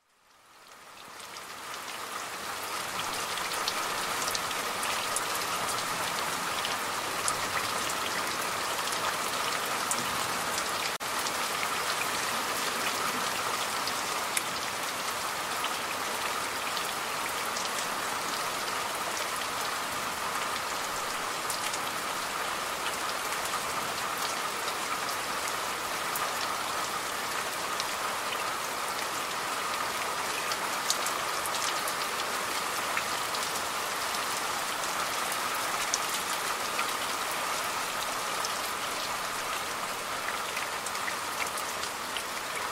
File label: Weekend Rain; Nature Recordings; Rain Sounds